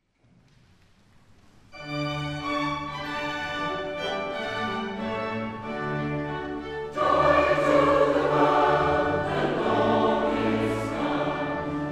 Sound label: Joy to the world; Här finns prov på inspelningar av ljud, video och bilder från körens konserter